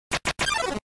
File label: Battle Encounter; Undertale Sound Effect